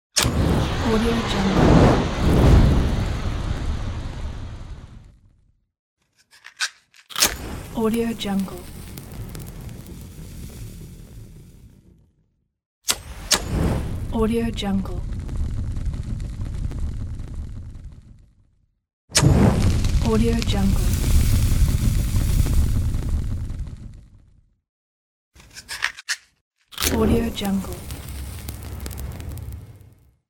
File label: lighting a fire pack